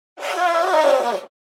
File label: Nose Blow Comical Longer Close Perspective; Human Sounds